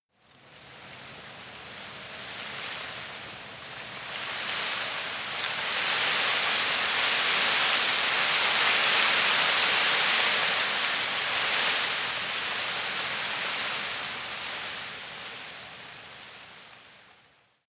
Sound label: tree leaves rustle medium